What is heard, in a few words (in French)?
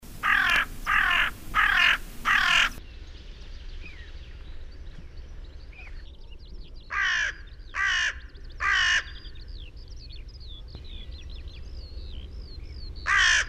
chant corneille